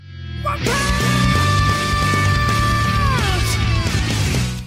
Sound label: hype; one punch